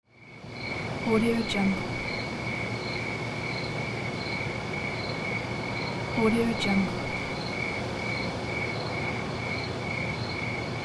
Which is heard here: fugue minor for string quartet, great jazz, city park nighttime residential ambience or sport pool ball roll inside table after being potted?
city park nighttime residential ambience